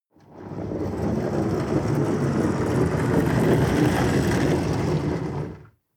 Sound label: metal roll large